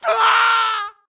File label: grunt death crush; Titanfall 2